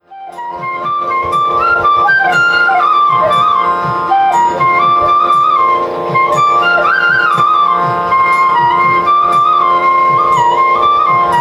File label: flute